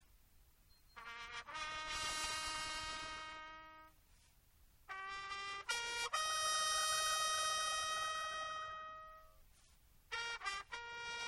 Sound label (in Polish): hejnal miasta lublin; symbole miasta